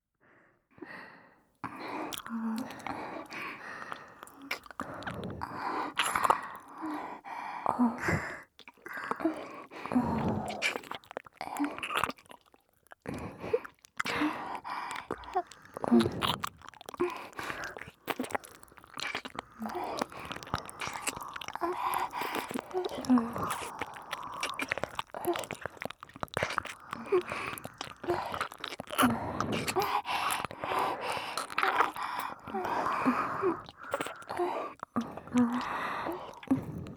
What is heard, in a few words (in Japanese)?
キス：両耳舐め; みさき＋先生